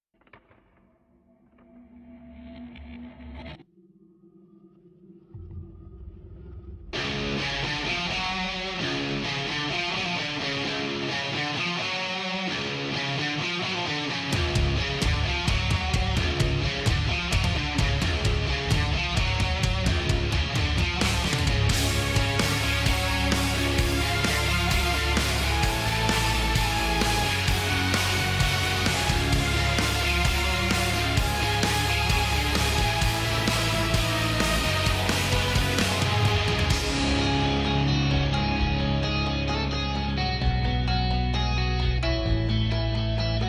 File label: Instrumental; BIONICLE; Glatorian and Glatorian Legends